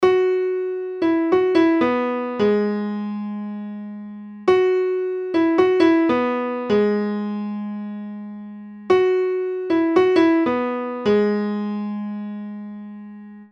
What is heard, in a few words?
Piano